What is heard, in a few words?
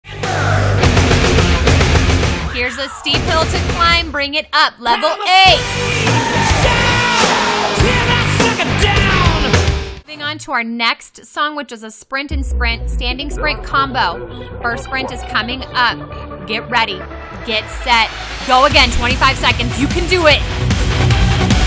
Rock Class Sample; ICOD Rock60